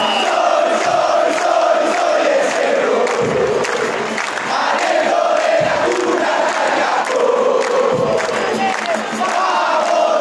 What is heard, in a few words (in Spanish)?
aliento de la cuna hasta el cajon, chants